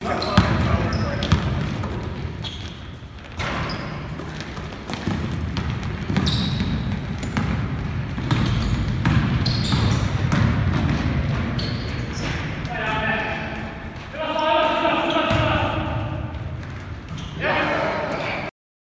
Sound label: Playing Basketball